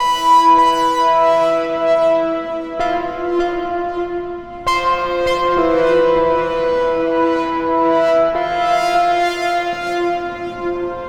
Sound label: Melota Keys, Drum n Bass; KJ Sawka; Drum n Bass & Beyond